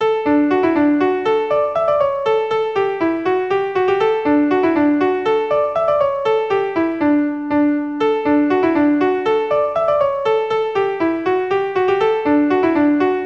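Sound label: Polka, Traditional Irish Music; Jer O Connells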